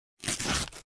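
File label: ripping sticker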